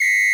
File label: Long Low Whistle, Roland SC-88 drums